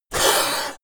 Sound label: yeti hiss; kreaturen